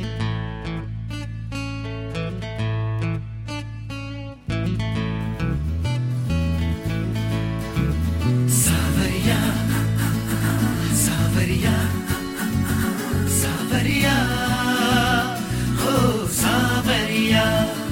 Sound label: guitar ringtones